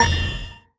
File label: dice stop